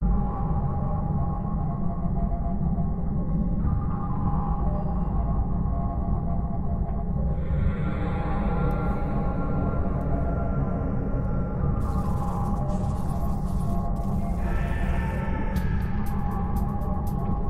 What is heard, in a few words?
Ambient Track Hihat; True Crime New York City; Xbox